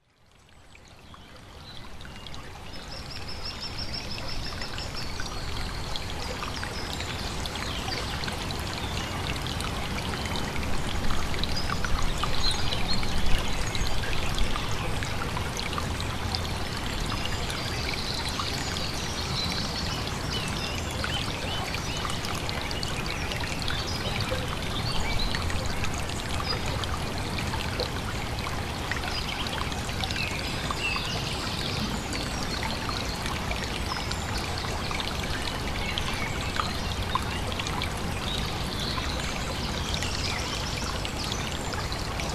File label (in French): Ruisseau et oiseaux; MZ-N710; les Seilleres